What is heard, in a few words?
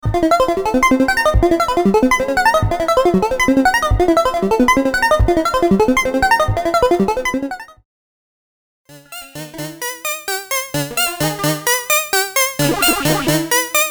Moog Modular